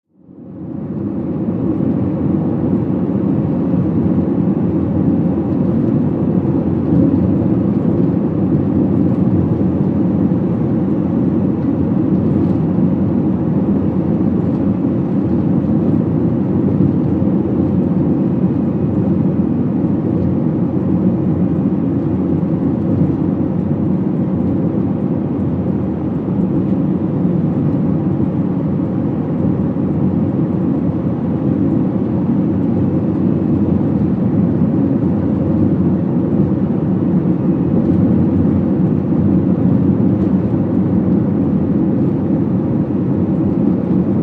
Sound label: Sm Car Drive 55 65 Mph, compact